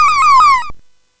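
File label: Nebulus, MOS 8580 SID chip music, games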